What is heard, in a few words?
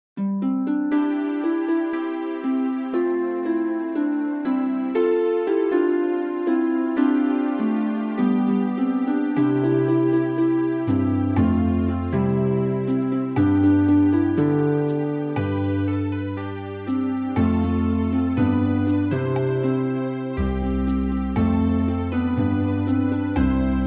instrumental